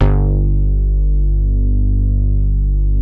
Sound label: G1 BOOGY BAS, NY SYNTH; Northstar - New Gold VOL-2